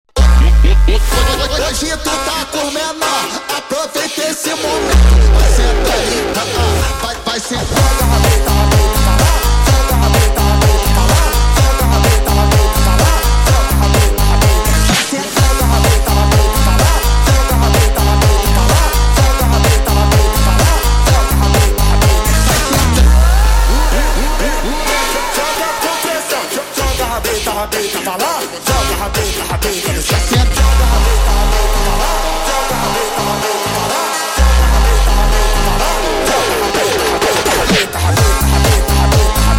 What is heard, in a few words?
Slowed Reverb, funk